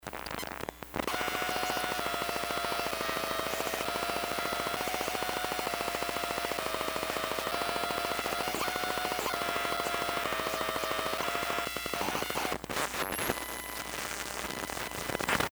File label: charger swan song